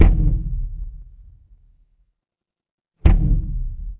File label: mechanical gear